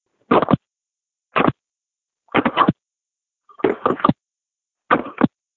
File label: Hangs Up Phone As Heard By Reciever